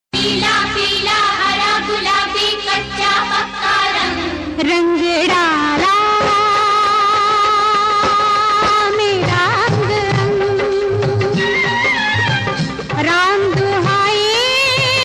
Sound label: Holi song